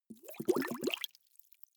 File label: bubbles soft